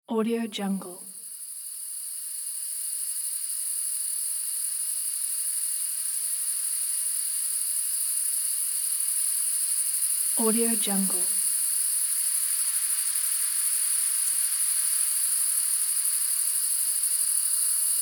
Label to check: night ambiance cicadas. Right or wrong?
Right